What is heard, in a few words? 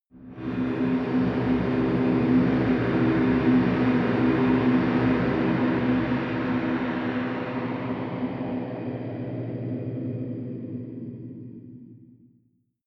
atmo stinger, horror